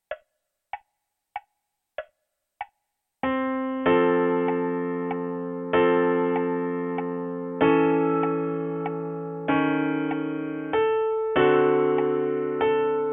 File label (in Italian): piano